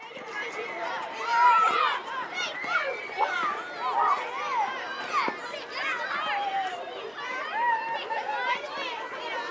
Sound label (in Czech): ambi ch; Mafie 1